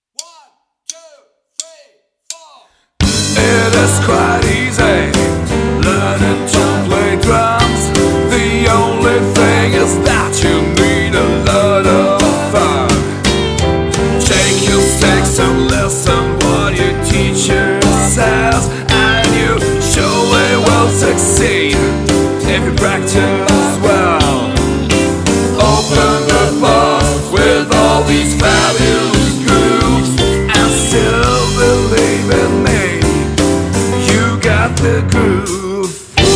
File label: Full 85BPM